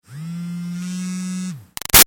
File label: Phone Cell LG Vibrate Smooth; Electronics; Cell Phones; Big Room Sound